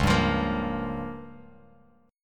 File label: Db 6th Flat 5th Bass Standard, Db6b5 chord; Db6b5 strummed; JGuitar